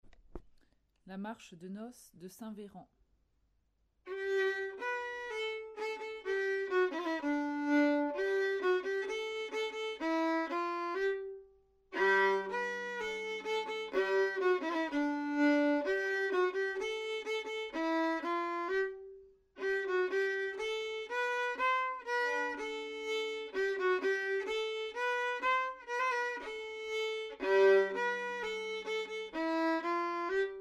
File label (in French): Marche de Noce de St Véran